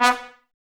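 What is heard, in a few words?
brass trumpets B; Miroslav Vitous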